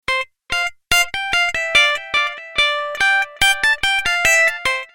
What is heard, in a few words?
Merry Christmas Tune